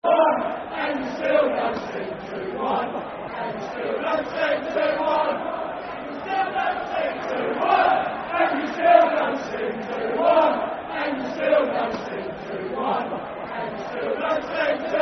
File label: football chant, soccer songs; 2-1 And You Still Don't Sing; Fulham Ffc